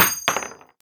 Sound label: Shell heavy wood; STALKER; IWP